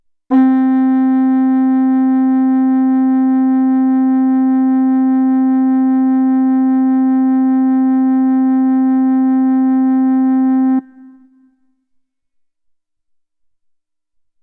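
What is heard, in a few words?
recorder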